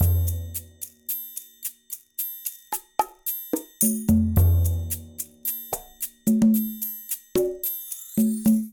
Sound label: Ballad-110bpm, Latin Percussion; Ableton; Factory Packs